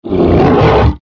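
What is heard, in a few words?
bear attack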